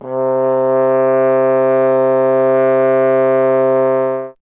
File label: Trombone C; Miroslav Vitous - Solo Instruments VOL-1